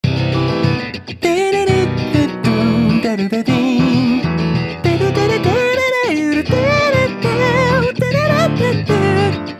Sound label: mute drum